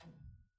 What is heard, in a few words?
Harpsichord Rel Main E2; Chordophones; Releases; Harpsichord, Unk